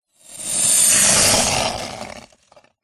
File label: sport ice skate pass by fast designed; zapsplat